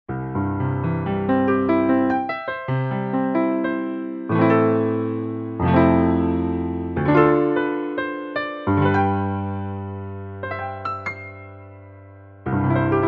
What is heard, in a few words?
Mega Piano